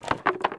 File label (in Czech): krabice; Mafie 1